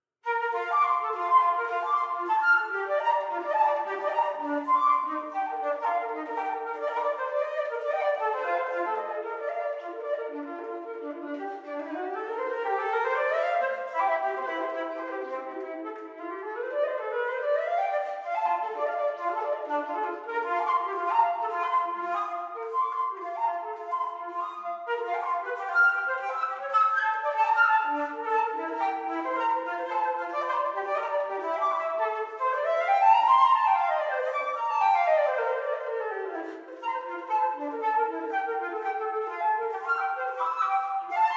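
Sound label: Prelude in B Flat Major BWV866, harpsichord; JS Bach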